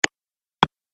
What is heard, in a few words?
Game Wooden Hits, video game; Video Game Sound Effects